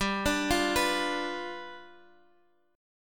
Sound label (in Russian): Perebor G7